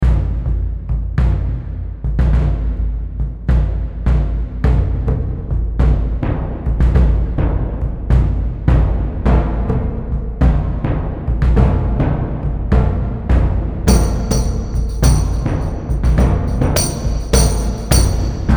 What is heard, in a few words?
perc orchestral